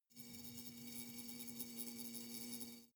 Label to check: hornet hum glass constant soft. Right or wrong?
Right